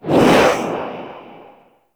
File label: Aftertouch Deactivate; Warframe; Ranger